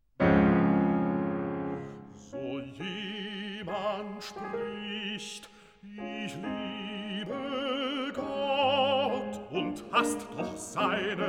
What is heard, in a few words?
Songs for voice & piano